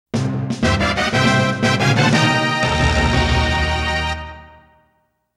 Tamagotchi House Completed; tamagotch minna de tamagotch world original soundtrack 1997

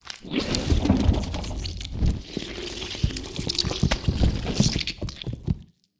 Swarm Inside Wall; silent hill homecoming; ps3

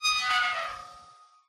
Doors metal gate automatic door squeak; MONO; ProSoundCollection v1 3

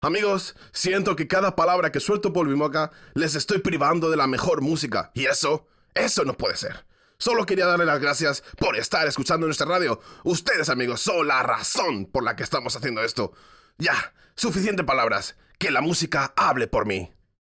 Music Vehicle Radio Events

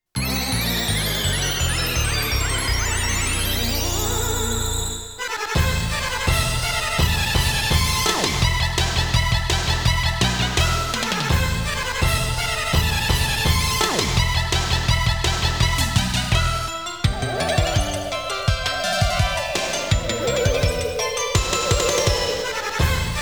Stage 5 Boss BGM, Piano Sonata No.11; Gokujou Parodius Kako no Eikou wo Motomete; Capsule Monster 'Cappuccino'; Mozart; 3rd Movement

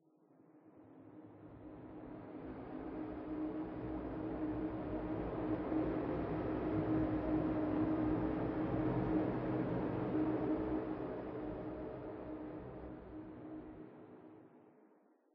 Ruin Pad

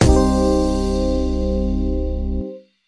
Retry Jingle; Friday Night Funkin' Doki Doki Takeover OST

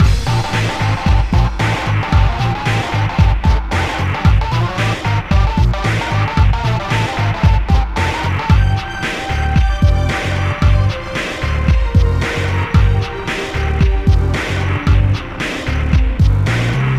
evil forest menu loop; super meat boy

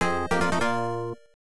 Credit Sound; The Return of Ishtar; PC-9801

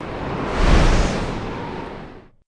Fly By; Learn to Fly 2; Pacifist

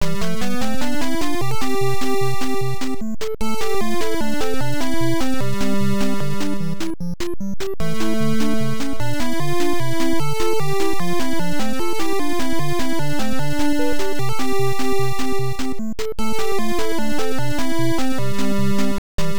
Stage BGM; Ochin ni Toshi Puzzle Tonjan; Family Computer

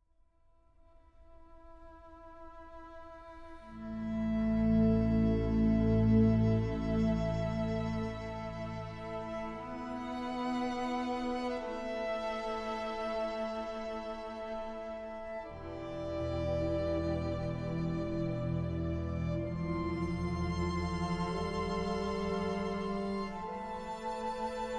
No Vocals; Assassin's Creed Syndicate

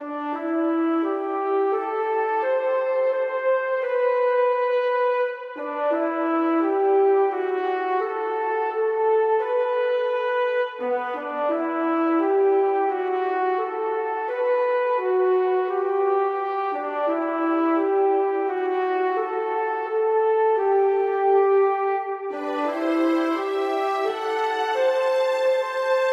Calm

